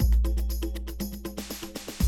120, Classic Drum Loops

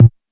Tech bd, Jomox Airbase99

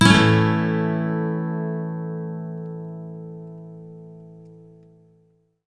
MAJ SWEEP A, Electric & Acoustic Guitar Loops; USB Soundscan vol.04; AKAI

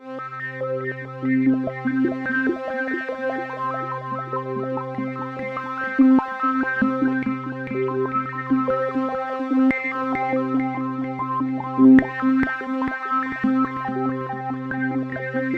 C3, SynthLine; 90 s Sample CDs; NiceBeats; SynthLine VOL-10

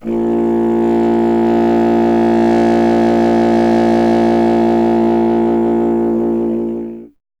C. Bassoon, solo instruments

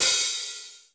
DRY HAT; DRY KITS; Crypton; DrumBase5000

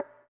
VIBRA WAH, guitar; Spectrasonics; Bizarre Guitar

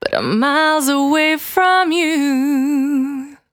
Cry 68bpm B, Vocal; Zero-G

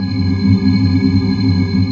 DEEP TUBE C4; Best Service Dream Experience

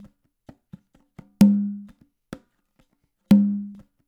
LOOP, DANZON, Percussion; InVision Interactive; Percussion Slam

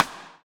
SD RI, SD Rim; Sound & Vision - Gigapack I

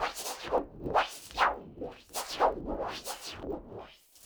RUSH; Spectrasonics; Liquid Grooves 1 & 2; ODDS + ENDS

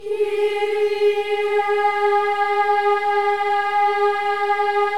KYRIE, Symphony of Voices

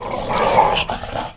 DOG; 3D Sound - 3D Keys VOL-1; MEAN 3D

